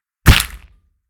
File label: Bone Flesh Crush Punch